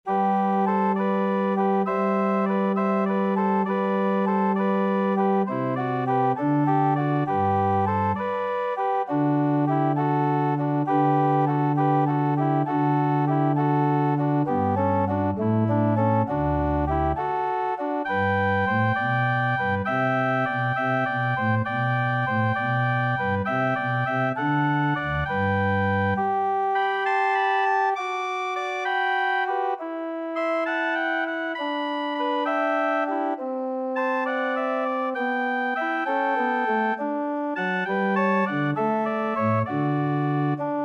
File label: pastorale, organ